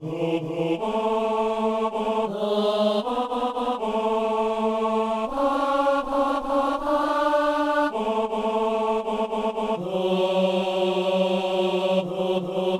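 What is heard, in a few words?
tenor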